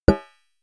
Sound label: Inharmonic Negative Backwards Caution Sound